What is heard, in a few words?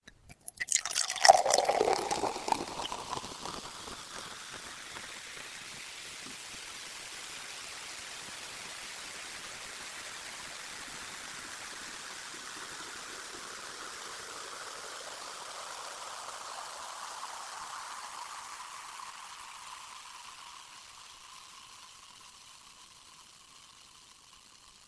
bottle